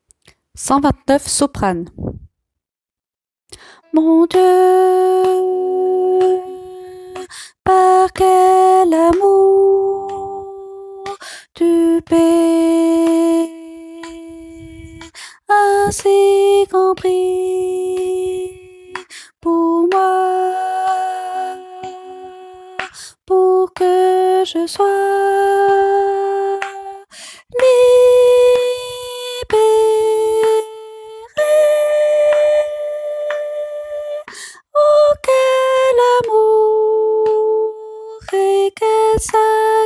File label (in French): soprano